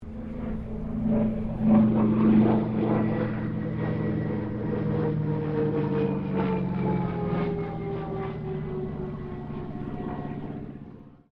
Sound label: AIRCRAFT CA-13 BOOMERANG PASS STEREO; Airplanes; Vehicles